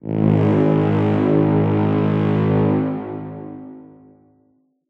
Cineamtic Bad Guy Horn Blast; Asylum

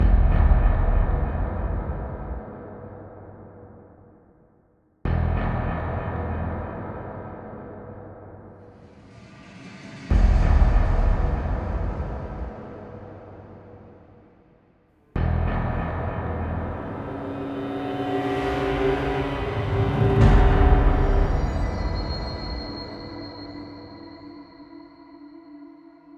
Dungoen Crawl Ambience

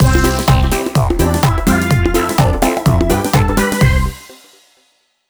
Stab; Buzz! Junior Robojam; PS2

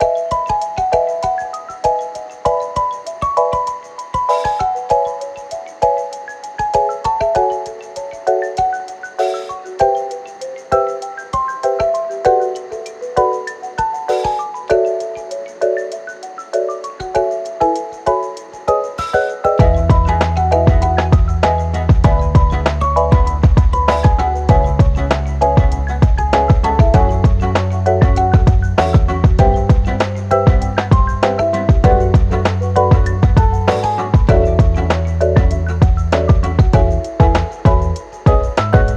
Lo-fi Remix; Pokémon BW; Occhiolism

